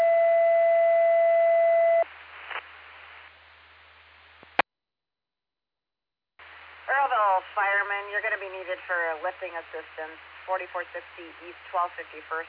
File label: Fire; Earlville; TTDAlerts